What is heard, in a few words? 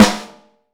Vintage Snare Drum